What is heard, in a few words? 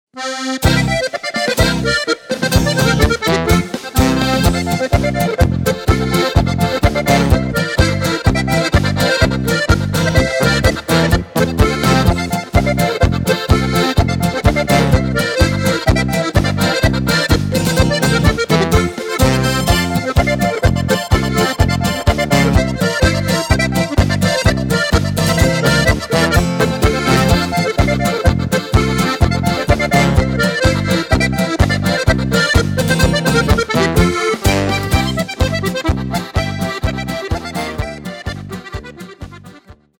Polka